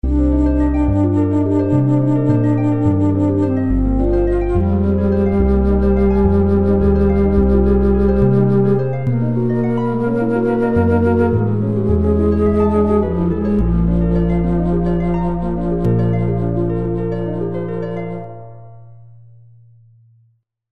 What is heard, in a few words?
Trumpet Song